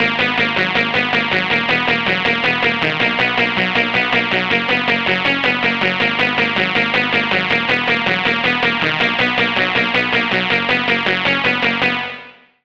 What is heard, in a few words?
Lead, Serum 2; Lead - Flame Feather; Routed Sound; Serum 2 Expansion - Flame Summoner Vol.1